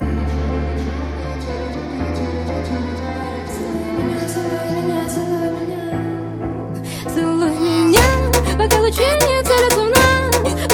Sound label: Phonk Slowed